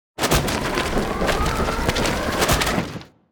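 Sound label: deadbook spawn, monster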